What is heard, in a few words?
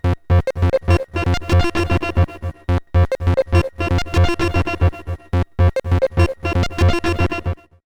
SOMA Synths plus SOMA Lyra8FX; ROAT